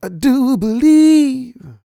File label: SING, Vocal Planet R&B & Dance; ILIO